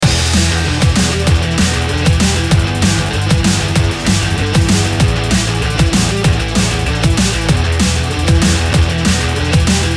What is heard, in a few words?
Drum and Bass Sport Loop